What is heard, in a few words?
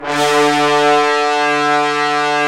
BRS, Roland LA Composer; Roland - LA Composer vol. 4; Tbns